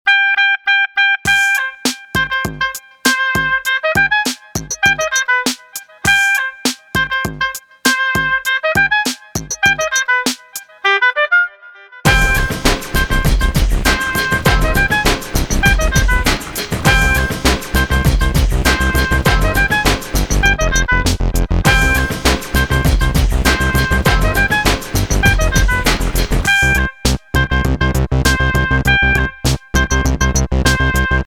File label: Oboe